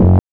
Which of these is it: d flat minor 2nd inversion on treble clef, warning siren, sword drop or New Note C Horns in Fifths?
warning siren